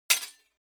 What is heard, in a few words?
weapons sword sabre metal block